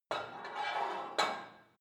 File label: put weight onto barbell quick, fitnessstudio